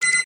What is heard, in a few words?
beep, futuristic weapons sound effects